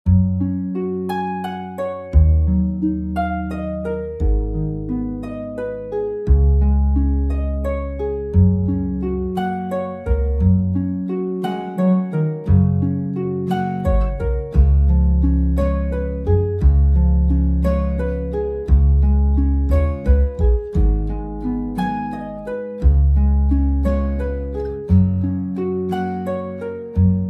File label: (Gb To G) No Backing Vocals